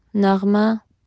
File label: melody